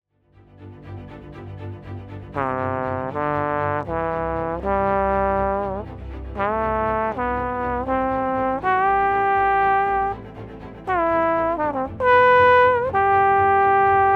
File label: horn test